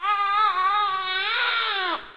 baby; Mafie 1

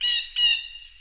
bird; Mafie 1

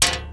metal; Mafie 1

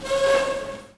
chair; Mafie 1

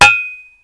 metal; Mafie 1

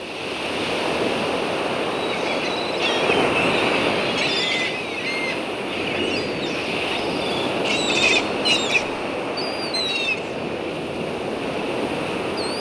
beach; Mafie 1

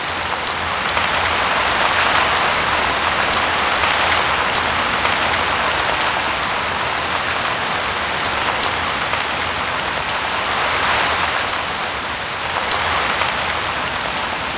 ambi rain; Mafie 1

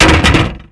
metal; Mafie 1

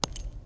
gravel; Mafie 1

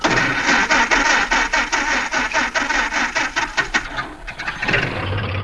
fordT on; Mafie 1

